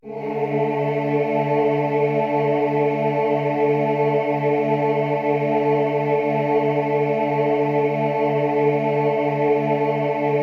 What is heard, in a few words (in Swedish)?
tenor bas; Härlig är jorden tenor o bas; valborg 2018